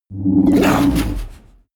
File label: kraken growl; kreaturen